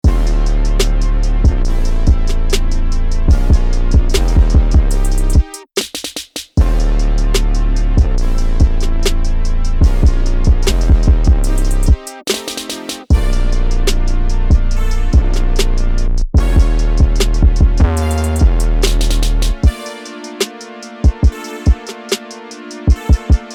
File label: Instrumental